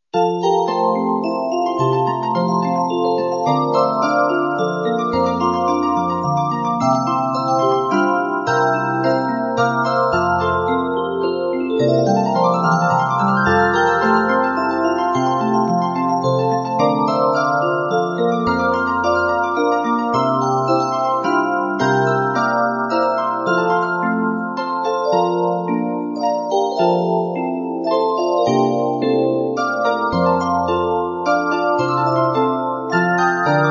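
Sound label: Sleigh Bells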